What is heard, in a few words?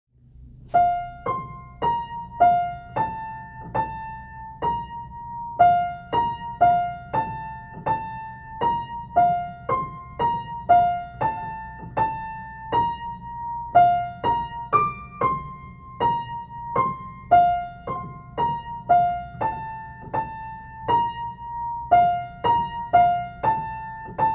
piano melody